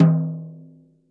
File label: rack tom - snares off; JazzFunkKit